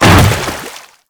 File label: Hammer Boss Step Water, footsteps; Warframe; Grineer Hammer Boss